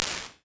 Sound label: Live Chamber, Impulse Responses, Small Spaces, Rooms; Apple